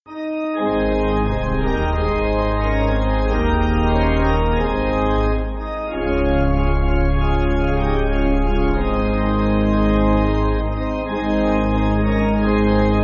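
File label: Pipe Organ; Albert B. Simpson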